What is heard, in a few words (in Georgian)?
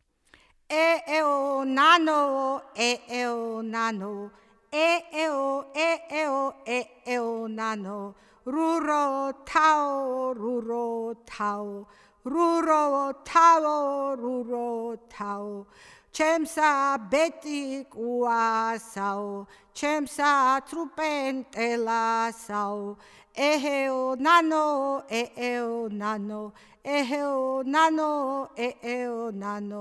nana akvnis dasazinebeli xevsureti; Mzetamze